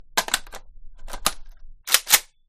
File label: svd reload, guns; resurviv